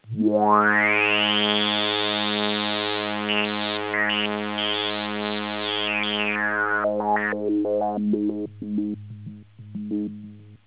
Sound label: ARP